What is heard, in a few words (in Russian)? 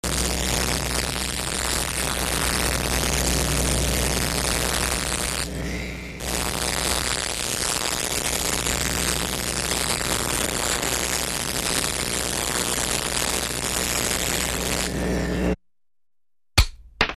elektricheskaja svarka detalej